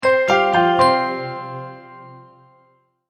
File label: multimedia logo, Musical Identity/Signature